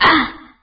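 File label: female wound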